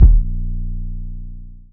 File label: 808's